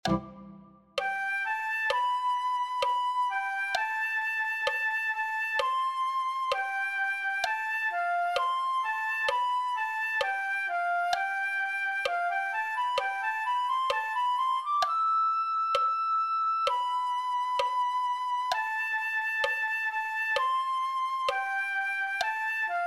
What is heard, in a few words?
flute